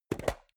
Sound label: metal box small open; maschinen; metall; soundpool